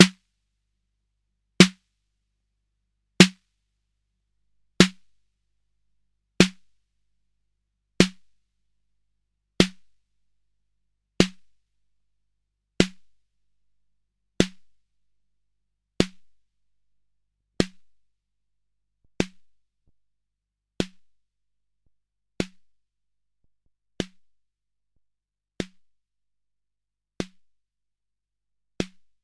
Waldorf CMP1 SNR Ratio 50 Attack 1.3 Release 5 Thresh 100 0 Bleed 0